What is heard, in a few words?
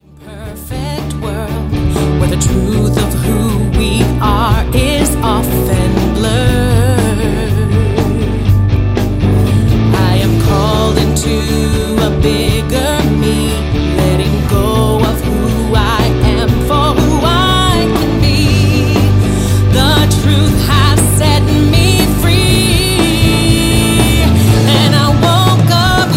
Happy Sample